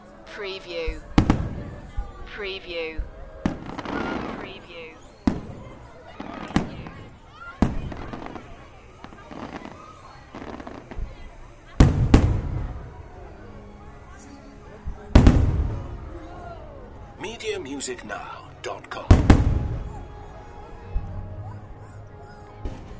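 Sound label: Fireworks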